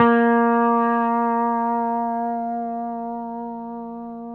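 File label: pedal steel F A#3; Country; PEDSTL NOTES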